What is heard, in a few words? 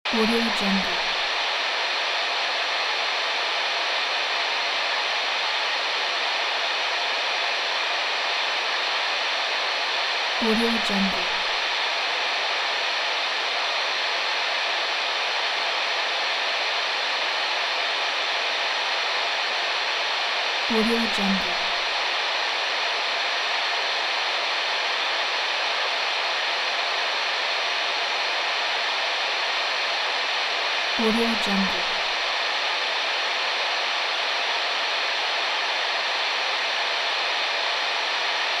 shortwave radio; sviridpavlov